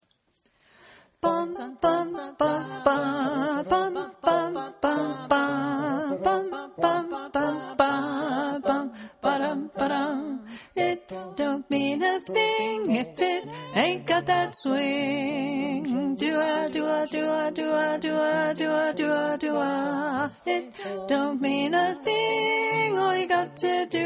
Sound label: Swing with slow ending alto